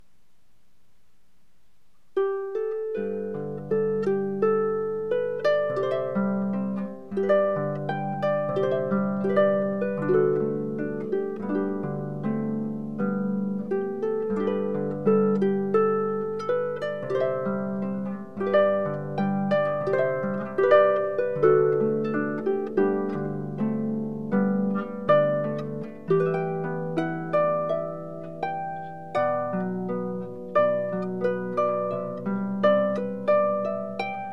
harp; Dominique Paliotta; Down by the Salley Gardens

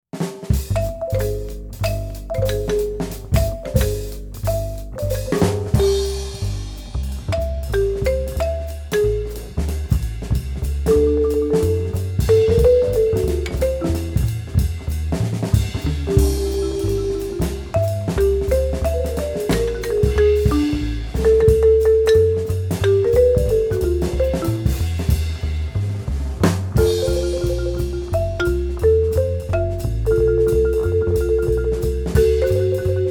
Jazz, Steel Drum Band; Atlantic City NJ

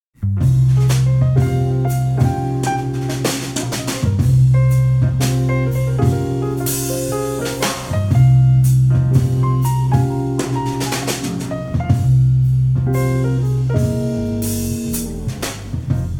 smooth jazz band; Cherie Blues

